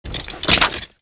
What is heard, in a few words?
wp foley at4 raise